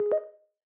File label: Pop-up Blocked, sound scheme; Longhorn 7ista Sound Scheme by David Mounder